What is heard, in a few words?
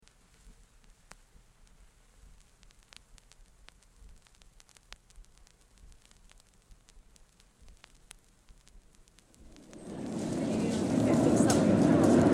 Train enters station, doors open